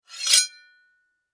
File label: Warfare Axe Blade Scrape; Axes (Sound Effects:Tools)